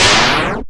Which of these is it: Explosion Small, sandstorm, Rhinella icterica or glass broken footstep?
Explosion Small